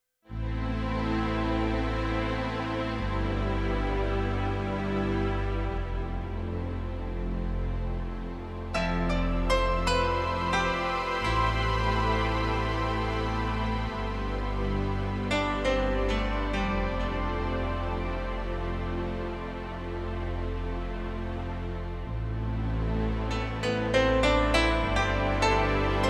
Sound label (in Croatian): outro dokumentarni film